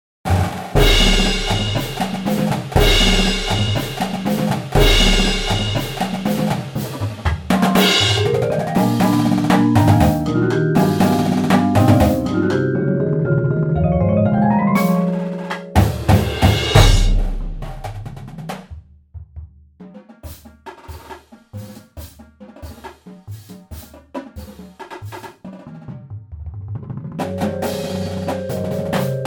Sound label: PERC